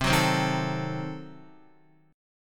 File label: C Diminished 7th C, Guitar 4F,4A,5C,5G,5C,6E; Cdim7 strummed; JGuitar